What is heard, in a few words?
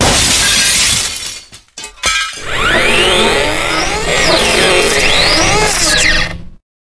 guard glass break; hl2